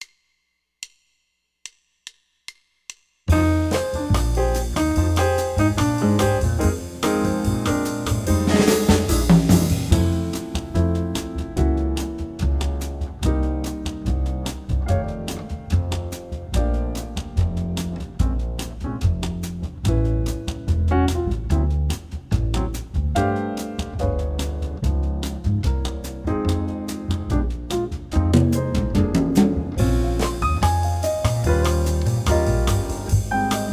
bossa guitar play along, jazz; LMEA All-State Jazz

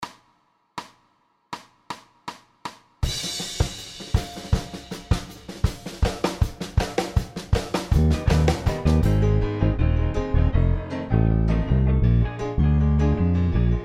Jazz DRUMS Play Along Alternate Style; LMEA All-State Jazz

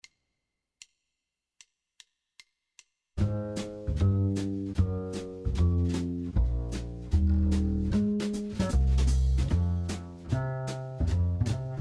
piano guitar play along, medium swing, jazz

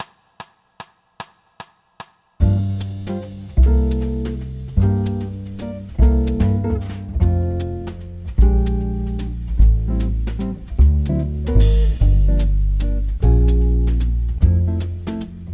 piano play along, waltz, jazz

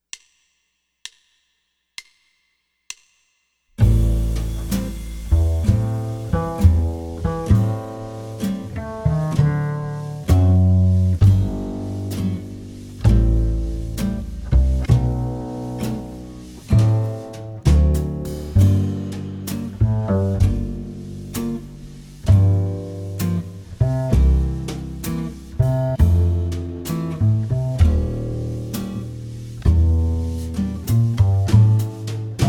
ballad piano play along, jazz; LMEA All-State Jazz

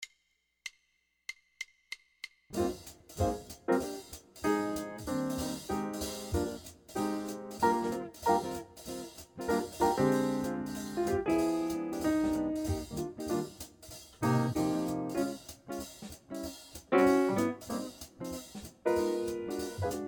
bass play along, up tempo swing, jazz; LMEA All-State Jazz

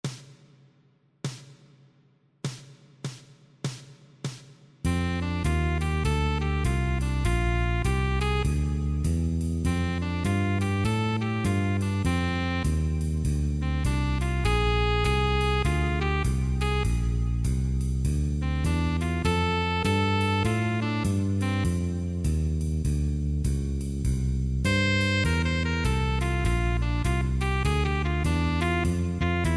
play along piano

